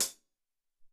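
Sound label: Closed Hat, Alesis SR-18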